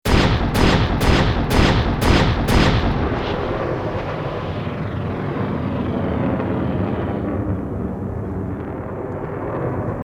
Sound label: Bullet Time; FXHome; Bullet and Gun